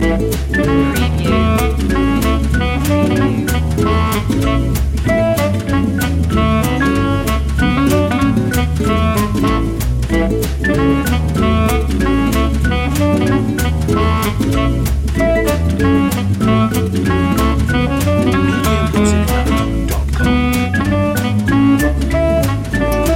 deck the halls jazz

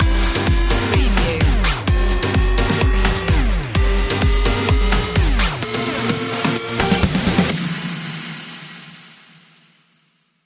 rock rush stinger

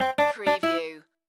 Casino Electronic Sound New Game

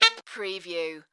Sax Beep

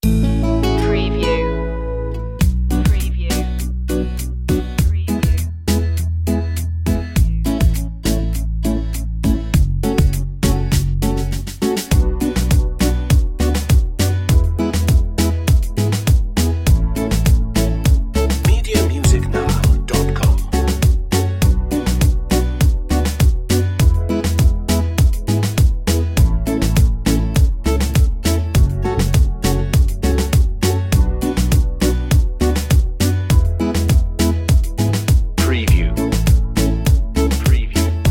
hot and smooth reggaeton underscore

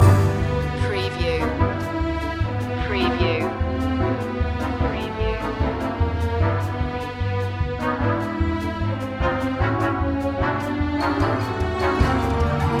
nostalgia tv loop

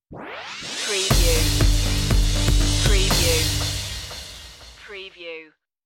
uplifting light stinger

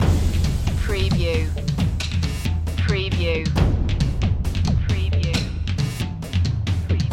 samurai percussion loop

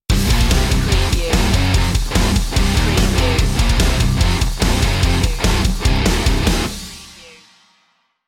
head stomp stinger

